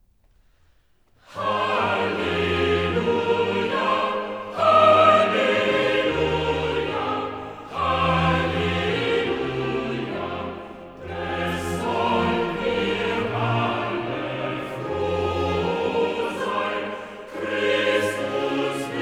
Choral; Bach; Cantatas; BWV 66; Erfreut euch, ihr Herzen; Halleluja! Halleluja! Halleluja!

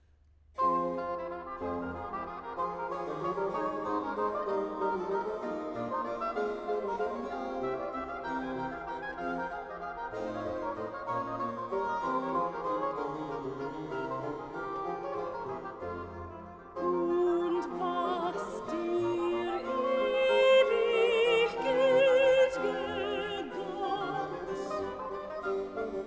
Choral Sopran; Und was der ewig gutig Gott; BACH VOCAL; CANTATAS; BWV 86; Wahrlich, wahrlich, ich sage euch

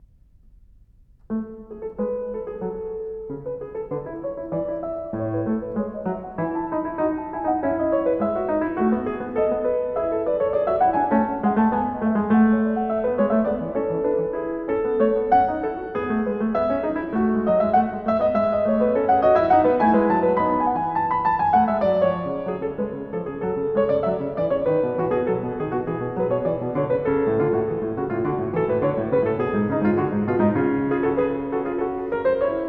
Zweistimmige Inventionen A-Dur; Zweistimmige Inventionen XII) A-Dur; BWV 798; BACH; Inventions

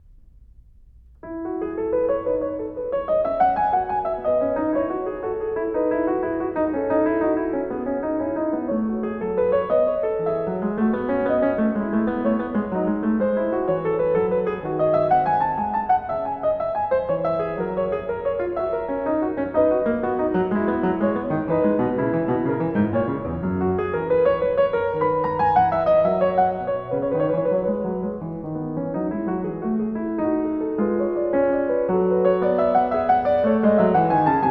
Zweistimmige Inventionen E-Dur, klavier; BWV 792; BACH; Inventions

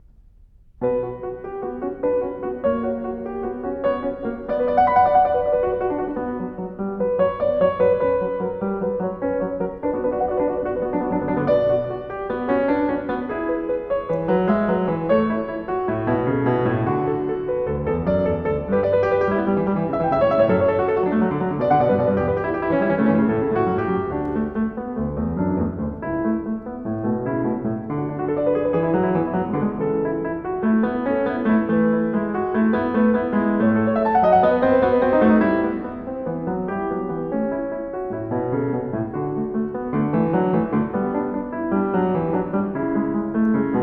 Zweistimmige Inventionen h-Moll, klavier; Zweistimmige Inventionen XV) h-Moll; BWV 801; BACH; Inventions

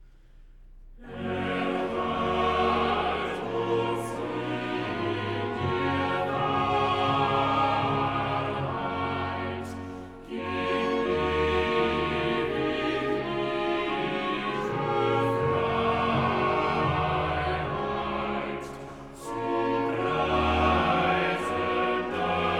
Choral; BACH VOCAL; CANTATAS; Gott der Herr ist Sonn und Schild; Erhalt uns in der Wahrheit

